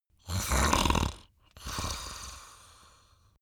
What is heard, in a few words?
chattering snarl; koerpergeraeusche; menschen; soundpool